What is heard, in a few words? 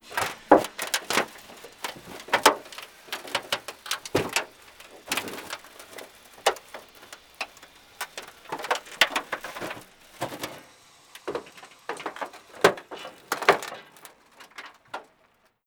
metal crumple pop; Call of Duty Modern Warfare